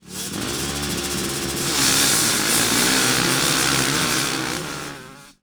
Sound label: Start, UI